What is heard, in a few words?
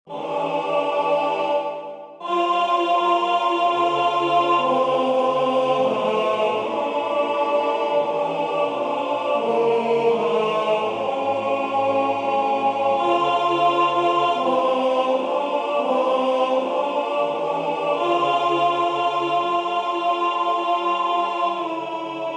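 alto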